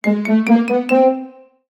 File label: cartoon ascend climb orchestral; zapsplat